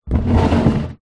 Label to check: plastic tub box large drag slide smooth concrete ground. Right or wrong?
Right